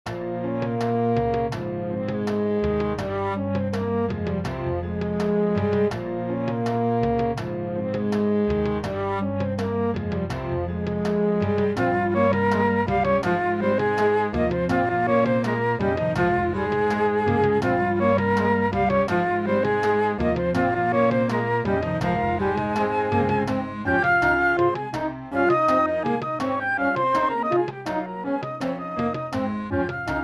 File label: piece in cuban style; caturla; drei stuecke